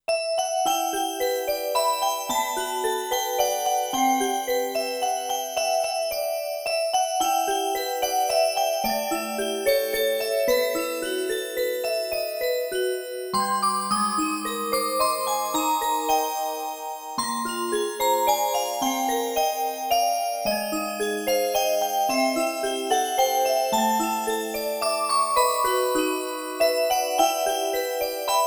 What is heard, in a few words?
Orgel Version; Tsuioku no Seijo